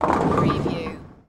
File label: Sports Bowling Multiple Pins Knock Over